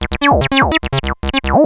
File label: saw